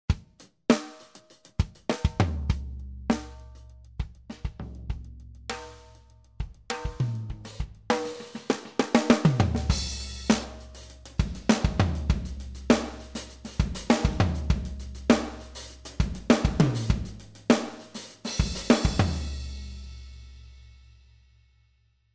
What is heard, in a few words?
PZM blend